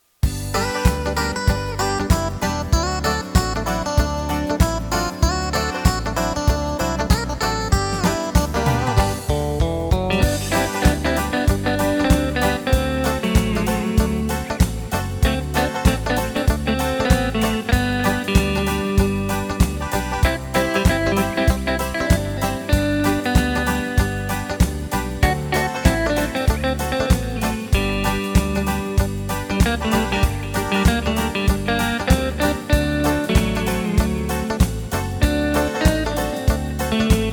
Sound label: Country